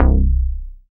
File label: BAS MKS-50, Roland